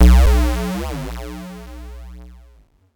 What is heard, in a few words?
Warning Last Boss